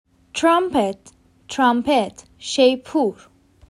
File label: Trumpet